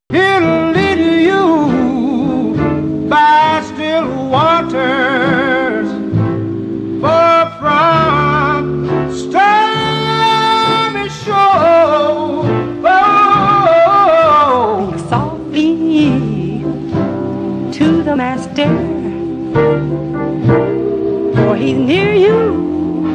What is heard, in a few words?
Just Whisper, Vocal Group; King